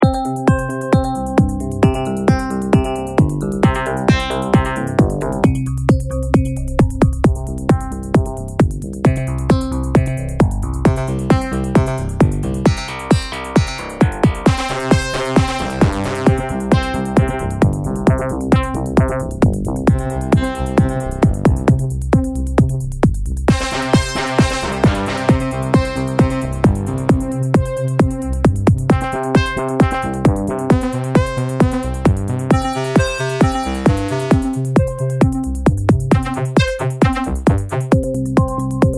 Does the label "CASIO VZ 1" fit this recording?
Yes